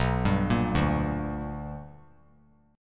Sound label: B Minor Major 7th Sharp 5th, chord; arpeggiated; JGuitar